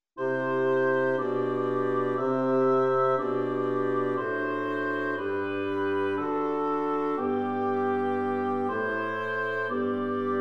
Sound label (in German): Choral